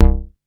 BASS SEQ C2; SEQ AND PERC; Music Store - Claudius Diemeter Schwerlos

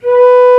Flute mf B2; Digital Sound Factory Virtuoso

